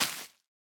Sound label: break, spore blossom; minecraft; block